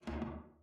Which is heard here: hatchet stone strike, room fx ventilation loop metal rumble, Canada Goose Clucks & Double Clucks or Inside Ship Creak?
Inside Ship Creak